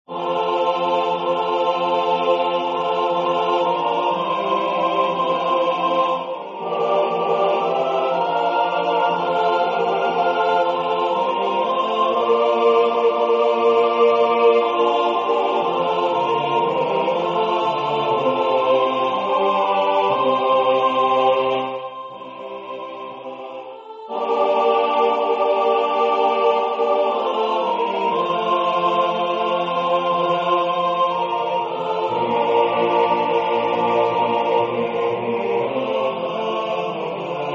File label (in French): tutti choeur; 7Paroles; Pater